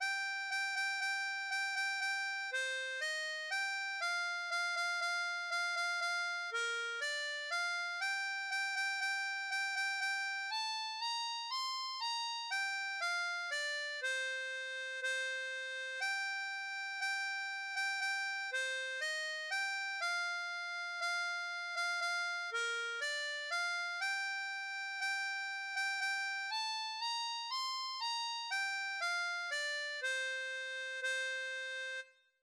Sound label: What shall we do with the drunken sailor, harmonica